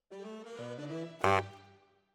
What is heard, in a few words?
Bari, RNR for piano